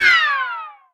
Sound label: hit shot bullet zip; Blind Drive